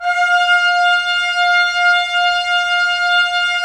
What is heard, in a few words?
Trumpets; Roland - LA Composer vol. 4